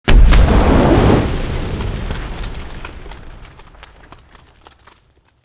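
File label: explosion close kaboom; corporate; Titanfall 2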